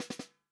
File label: FUNK SNARE CHROMATIC, REAL DRUMS; Best Service ProSamples vol.43 - Real Drum Kits